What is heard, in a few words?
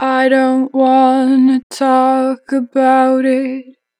I don't want, 2 bar vib, Vocals, Natural Vibrato; Harmor Vocals